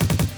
Machine Gun Kick; BB 2HTC Samples Vol 4; Drums

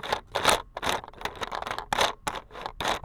Plastic Caster; BB Retail Therapy Sample Pack

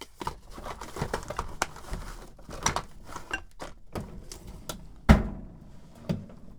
Rummage, Toolbox Rummaging

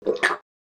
slime; creature

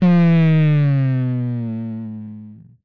Bomb, retro arcade; Guns

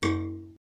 spring

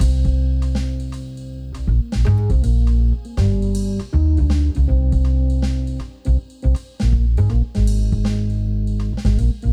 Chill Funky, Loops

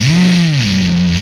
Rev, Retro Arcade Sounds, Vehicles

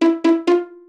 Pizzicato jingles; music jingles; kenney

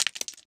rock breaking

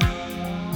Big Snap, Drums; BB 2HTC Samples Vol 4

